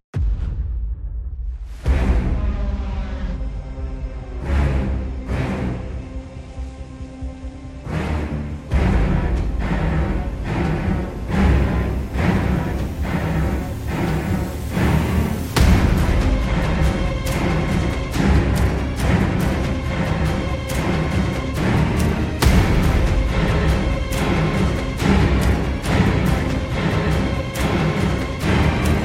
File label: Horror Background